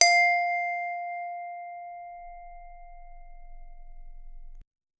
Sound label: a clean dry audio recordi, handpan